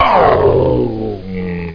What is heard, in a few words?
morph